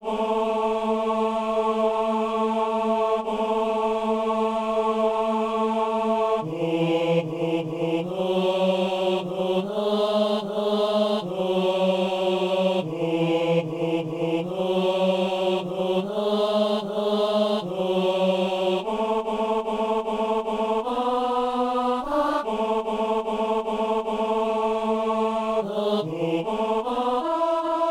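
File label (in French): ténor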